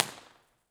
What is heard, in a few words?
Lake Merritt BART, Impulse Response; EchoThief Impulse Response Library